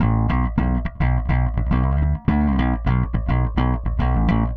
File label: Bass, Indie Rock; Sonic Foundry (Sony Creative Software); Indie Rock Anthems